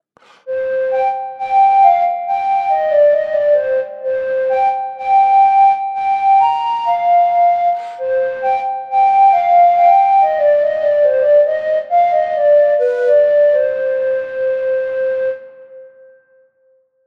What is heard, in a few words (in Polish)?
Fletnia Pana